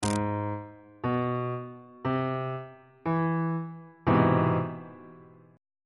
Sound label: d double flat augmented major 7th 2nd inversion on bass clef